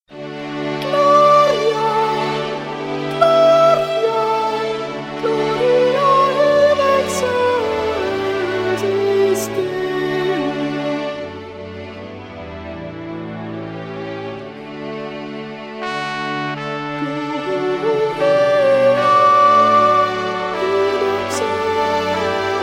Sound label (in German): Motette Sopran